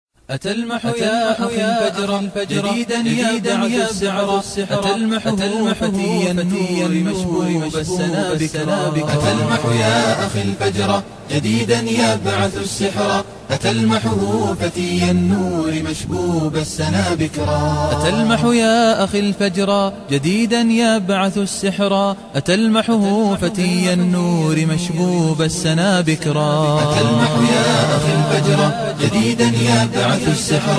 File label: Vocals Only, nasheed